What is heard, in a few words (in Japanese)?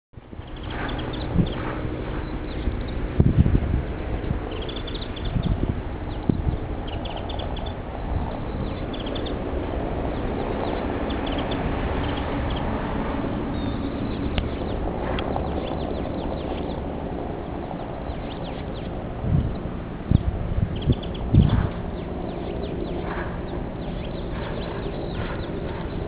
mejiro